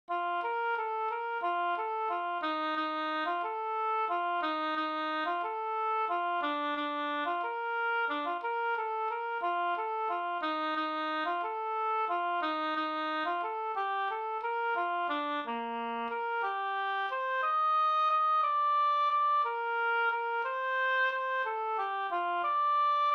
folkdans, Bbdur; Hammerschmiedgesellen

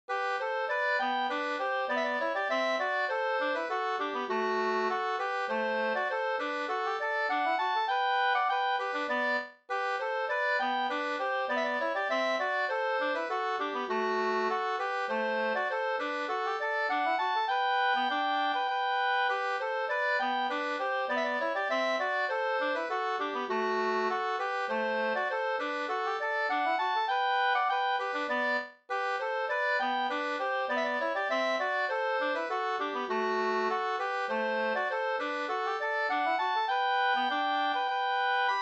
ganglat; Ostra Grevie ganglat av Carl Erik Berndt Lund

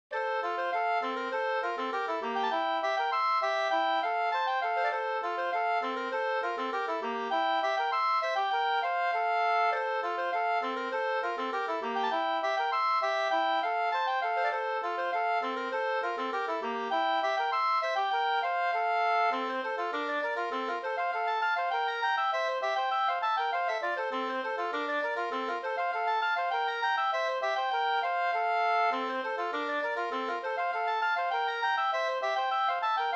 polka, 2st

